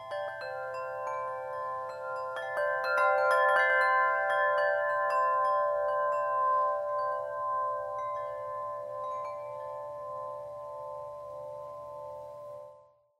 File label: Bells, wind chimes; Bibical Bells; King David's Harp 37in